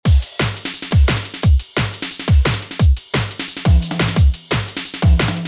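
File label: DNB ADDITIONAL PERCUSSION ROUNDS OUT BEAT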